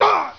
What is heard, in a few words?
huh, Voice